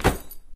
moped wheelie land; video games; player; taunt; source engine; tf

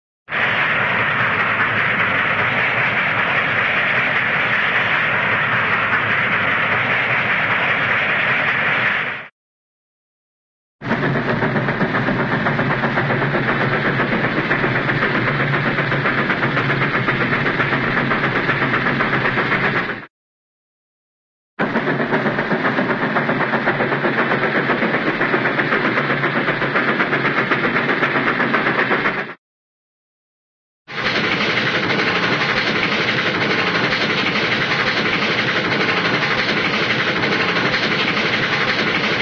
train, steam running; cartoon

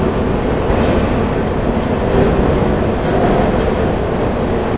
industrial, ambience

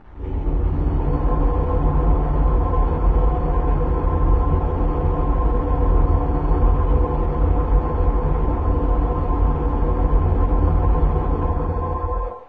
metallic, ambient atmosphere; source engine; hl2; video games

